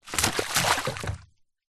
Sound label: wood stick large throw into puddle water splash; foley